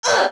Archer femele damage

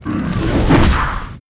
WAR ATTACK; SIZZLER; Mob

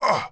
Archer damage

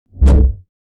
beam staff, Weapon

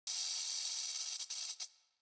Rattle Snake, ambience

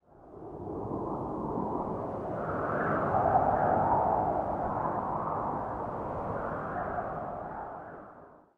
Desert Ghost Wind, ambience

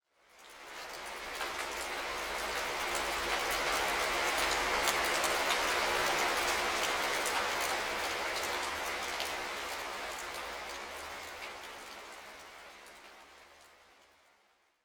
Rain, ambience

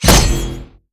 Bsword Metal, Damage

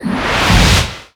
FLAME ARROW

